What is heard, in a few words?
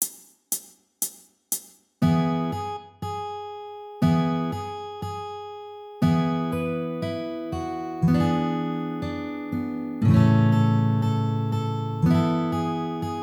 Jingle Bells Medium 120bpm